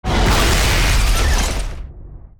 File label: Crash